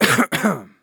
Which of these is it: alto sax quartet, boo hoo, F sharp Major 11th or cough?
cough